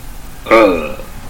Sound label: ughhhghghg